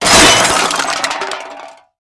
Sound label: Junk Crash